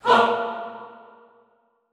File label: HAH A2, Classical Choir, SHORT HAH; Best Service